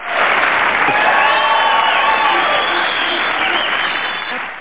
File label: Applause with Yea